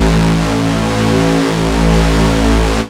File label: saw lead synth; Techno Trance; Ueberschall; Techno Trance Essentials